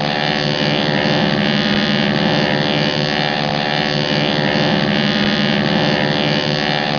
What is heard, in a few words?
plane idle; svencoop; breakfree3